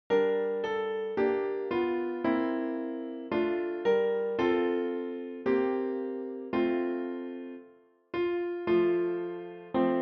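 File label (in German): Herbei o ihr Gläubigen Klavier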